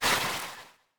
slide, breeze; mob; minecraft

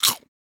eat chips, potato

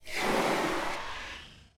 plague whale elder idle

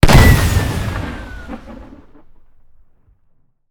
sprout respawn; player

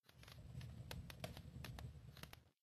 smoker smoke; minecraft; block

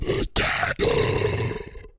say, zombie potato

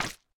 resin hit; block; minecraft

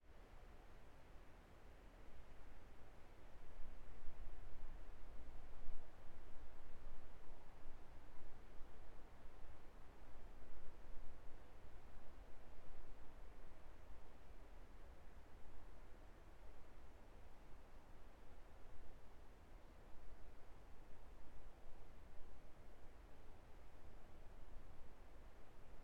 fields, ambient; minecraft

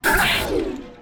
elder hit; minecraft; plaguewhale

